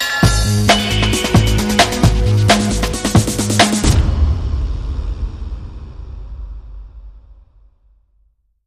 Electrolized Sweep

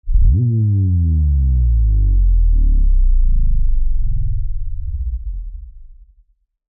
Hushed Power Down

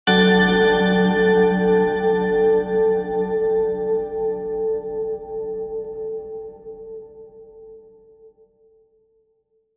Magic Chime

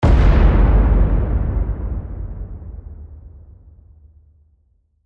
Subtle Hybrid Hit

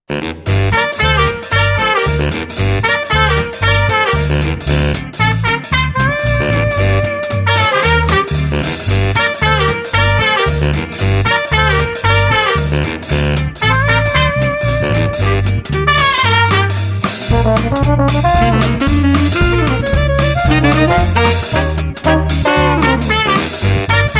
Jazzy Scat, No Vocals

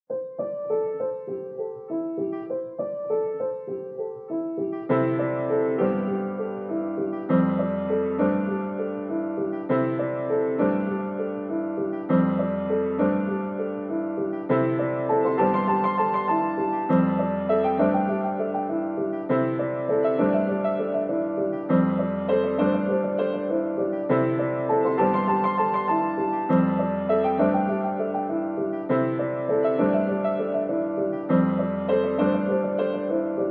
Pianos Only

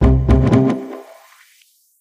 Thumper Tone Transition

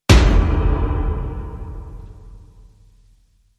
Main Hit, Sting

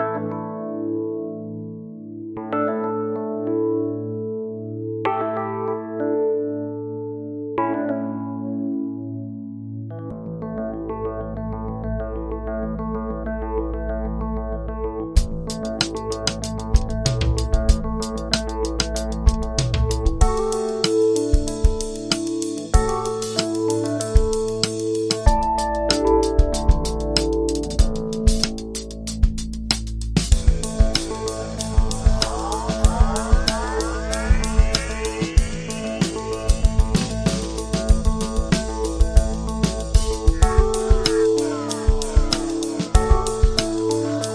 Moody Electric Piano

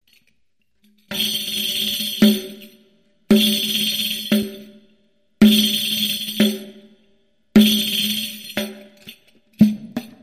Req Solo

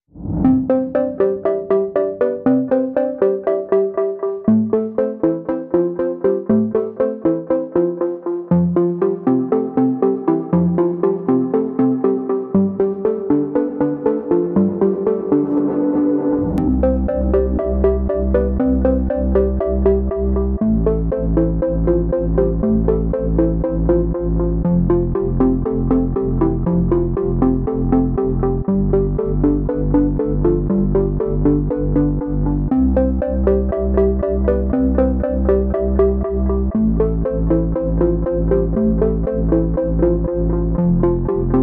Flowing Smoothly, Underscore

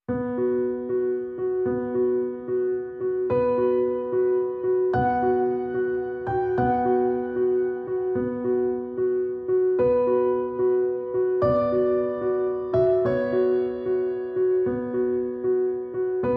Solo Piano

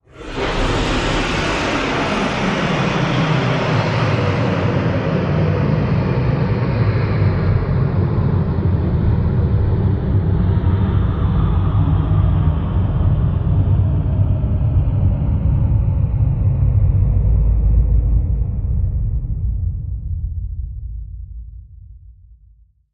fly by screech

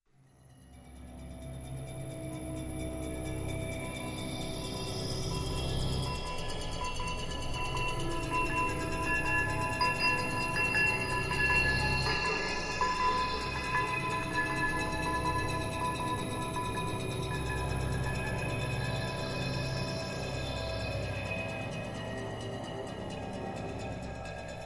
Drones

